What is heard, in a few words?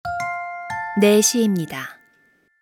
Hourly Alert